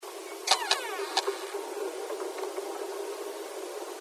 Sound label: camera; bigfoot